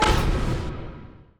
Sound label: UI Counter; Monster Jam Steel Titans